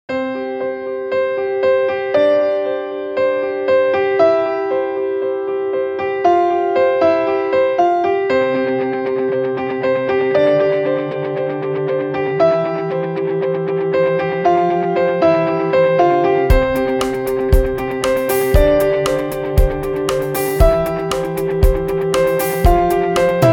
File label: Inspiring Piano